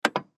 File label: Button Switch; Multimedia Web Interactive; Technology; Blastwave